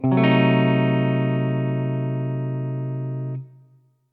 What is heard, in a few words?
dim chord